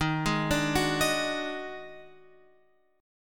D sharp Minor Major 7th Double Flat 5th

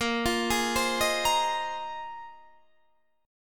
Bb 9th Suspended 4th Bb down Guitar Open D

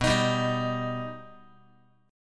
B Augmented B down Guitar; strummed; JGuitar

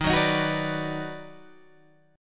D sharp Minor Major 7th Sharp 5th, Guitar Open G

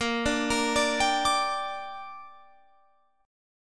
A sharp 6th, Guitar Open F; A#6; arpeggiated; 22,21,22,21,22,21

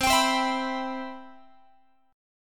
C Major 7th, Guitar; CM7 strummed

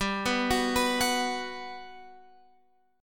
G Major 7th, Guitar, Open F; GM7 arpeggiated

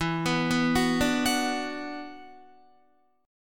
E 7th Suspended 2nd, down, Guitar; E7sus2 arpeggiated

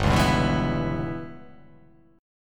G 6th Flat 5th

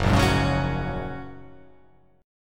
F sharp Major Add 9th F sharp; F#add9 strummed

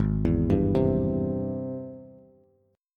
Ab Augmented Major 7th Ab Bass; arpeggiated; 4,7,5,5; Ab+M7; JGuitar

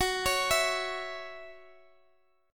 C sharp Minor Double Flat 5th F sharp; C#mbb5/F# arpeggiated

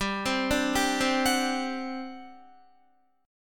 G Major 7th Suspended 4th, G, down, Guitar; GM7sus4; arpeggiated

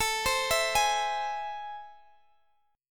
A Minor Major 7th

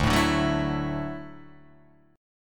D-Major Add 9th D down Guitar Open D

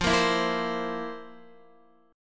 F Major 7th Suspended 4th, Guitar; FM7sus4 strummed; JGuitar

